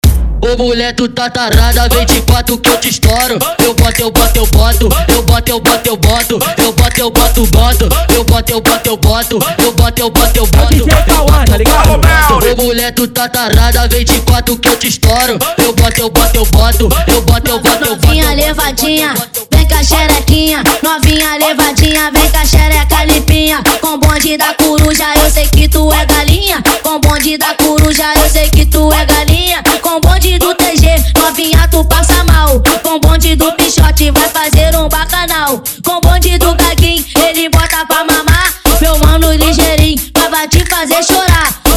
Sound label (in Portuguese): ARROCHAFUNK 160 BPM